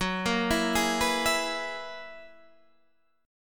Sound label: Gb 11th, Guitar, Open D